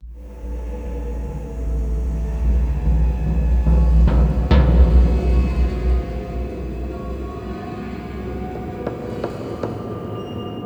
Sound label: Mysterious Stinger; rise of nightmares xbox 360 gamerip 2011